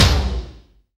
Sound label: TOM TOM, Roland